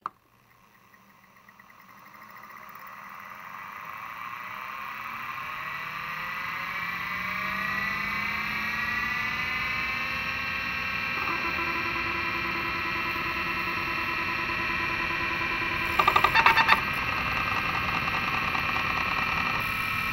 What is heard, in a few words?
hard drive boot